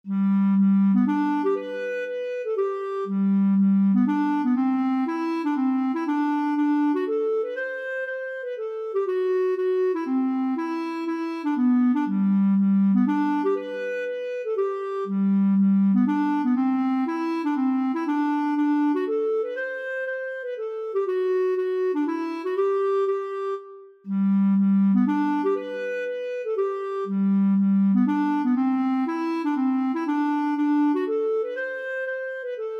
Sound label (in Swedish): G-durspolskan